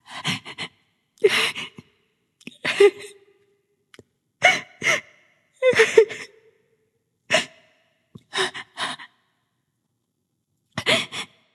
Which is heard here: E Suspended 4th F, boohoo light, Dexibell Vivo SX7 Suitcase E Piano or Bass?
boohoo light